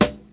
00s clean acoustic snare g key, steel snare drum sample tuned to the G note